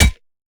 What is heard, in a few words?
pickaxe strike soft; Rust